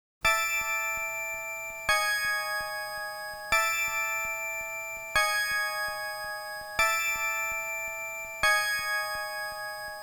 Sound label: Alarm Bell; sfc; Sword World